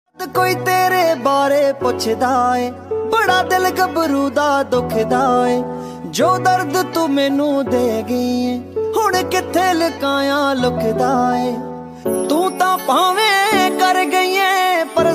Dance Punjabi Ringtone

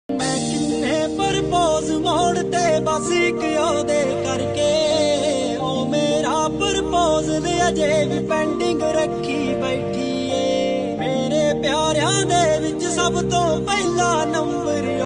Ringtone

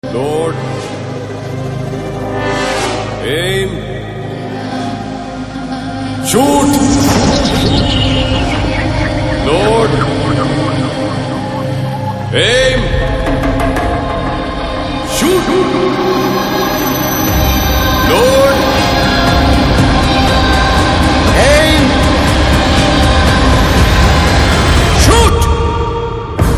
Telugu Ringtone; RRR

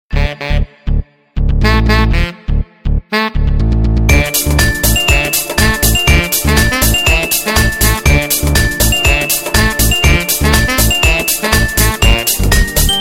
Vertu Saxophone Ringtone

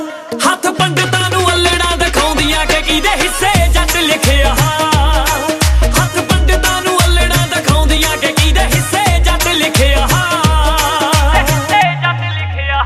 Punjabi Ringtone

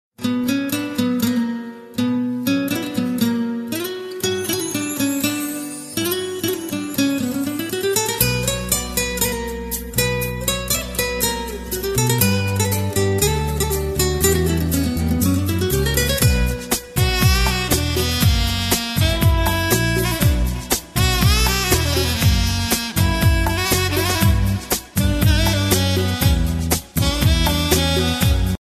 Telugu Music Ringtone

